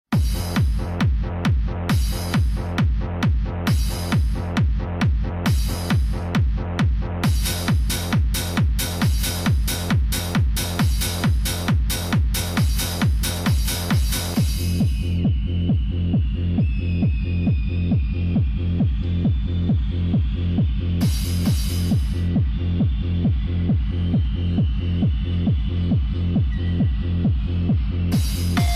electro music; alternative

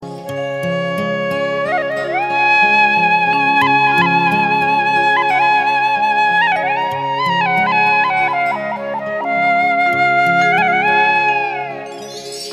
slow morning alarm; classical

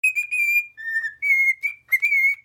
Cockatiel

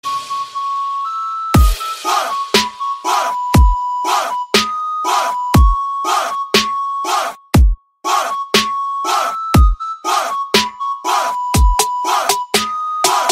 Turkish Trap

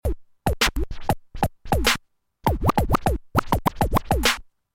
Two Turntables

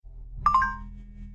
Message

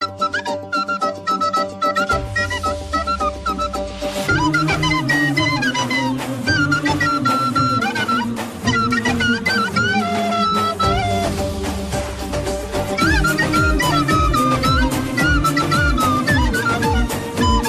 dombrra

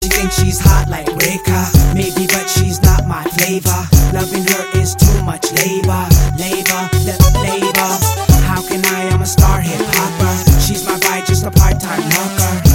super hip hop

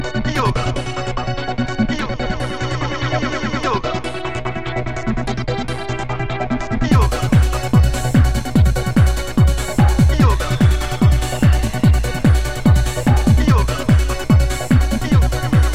hottest ringtone, hip hop

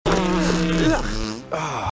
Fart

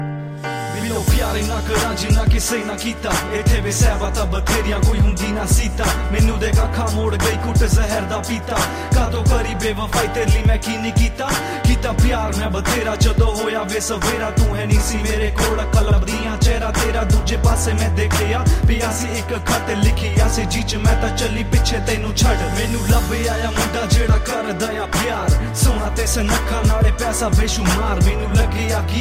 sad song

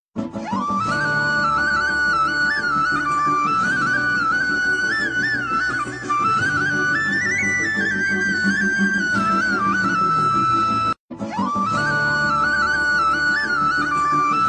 flute, ringtone; singham; blues

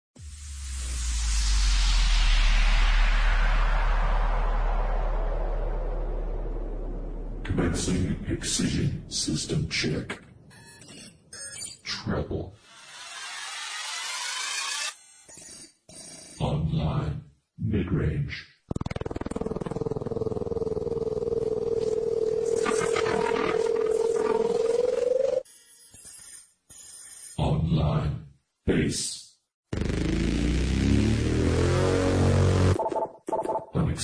dubstep; shambhala 2008

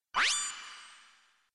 Codec Open

